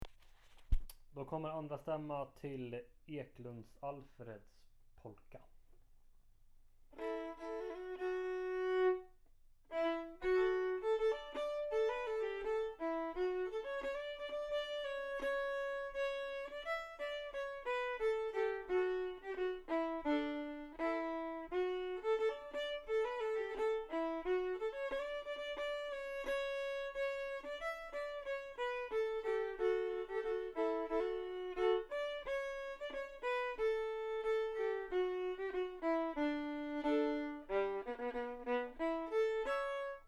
polka, 2a-stamma; Eklunds Alfreds polka, Aland

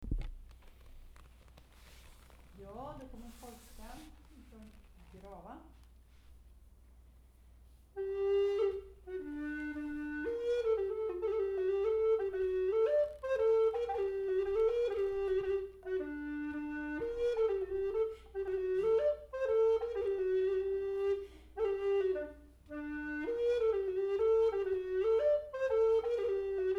Polska; Polska 1 efter Gustaf Lofgren, Grava SvL 303

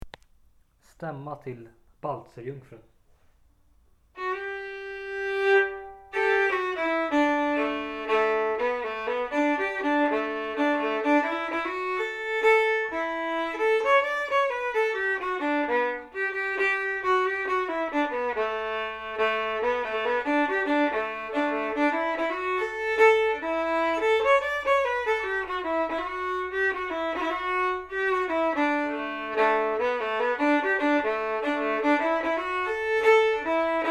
Vals fran Alvdalen, 2a-stamma; Baltzerjungfrun

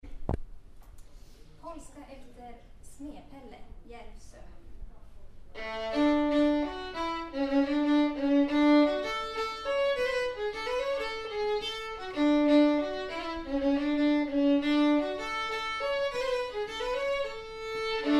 Polska, Jarvso; Polska efter Smed-Pelle

